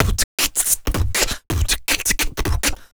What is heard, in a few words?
Human Beat Box, Drum & Bass; Loopmasters